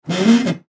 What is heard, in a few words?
Stall Door Creak, Public Bathroom; INDUSTRY; Household; Blastwave